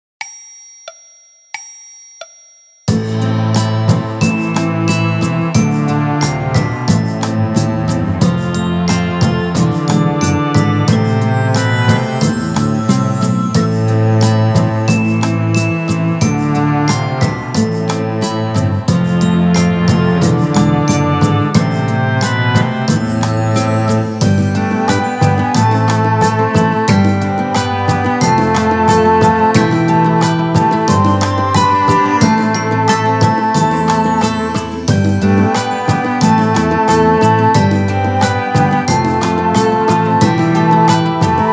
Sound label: Orchestra Version